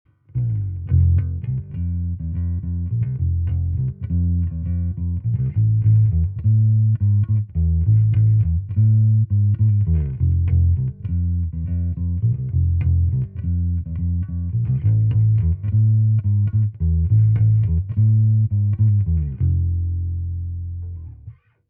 Preci Mid Bass Boost Hi cut

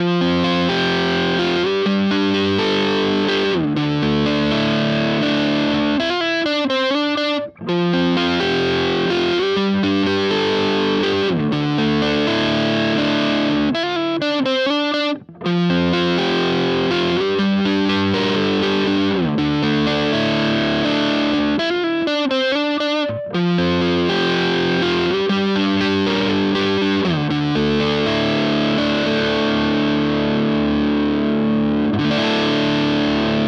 Hagstrom Ultra Max Neck

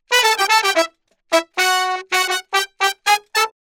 horns

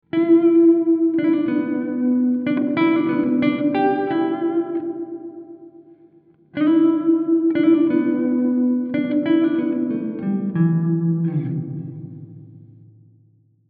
Twangstroem Guitar Lick Initial Patch Mono

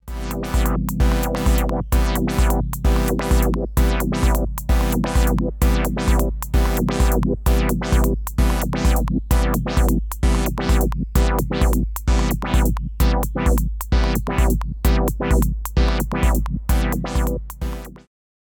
Juno6 Filter Pad; Rossum EM Control Forge

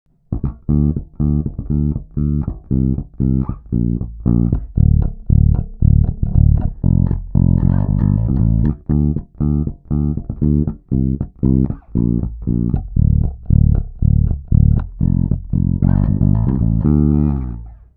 Harley Benton BZ 5000 Both PU passive Fingerstyle

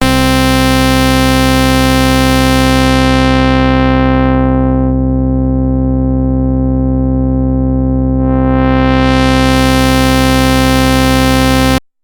Roland SH-101 Filter

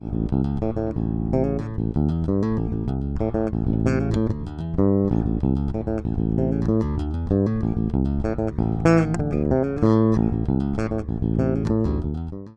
e bass ba12mkii

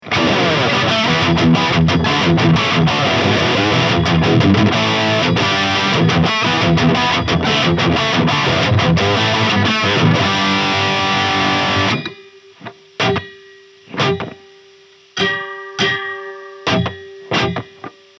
ESP LTD AA-1 BLKS lead; Alan Ashby

